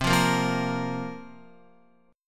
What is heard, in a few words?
C 7th Suspended 4th, Guitar, chord